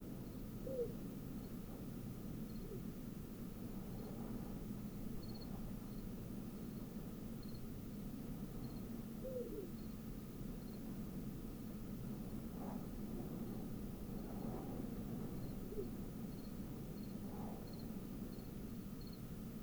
Pharaoh Eagle Owl Hooting Of Distant Presumed Pair